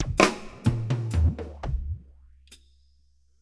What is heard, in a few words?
Fills, Monster Beats; Zero-G